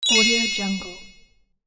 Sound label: interface arp down thin glassy; interface sounds